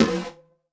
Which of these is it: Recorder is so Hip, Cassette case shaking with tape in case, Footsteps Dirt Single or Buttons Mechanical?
Buttons Mechanical